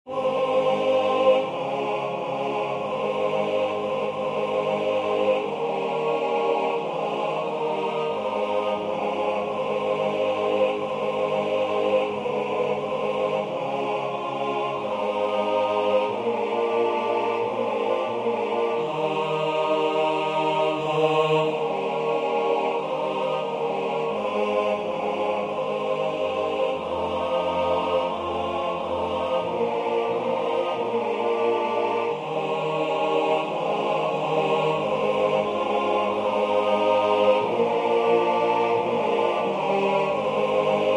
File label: Alma Mater TTBB